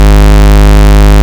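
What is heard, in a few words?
Ob Saw Square, Synths; Multisamples; Ableton Live 12 Lite; Core Library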